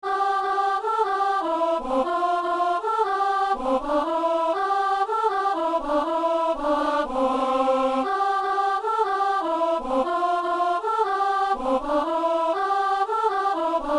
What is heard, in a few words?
soprano